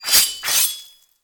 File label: Skill Ninja Blade; Legend of Keepers